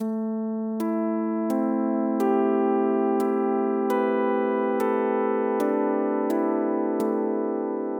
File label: thoughtful piano drift